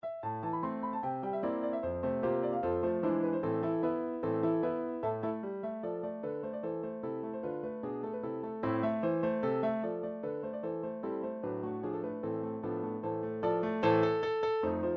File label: german song, midi